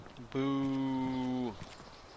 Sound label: booo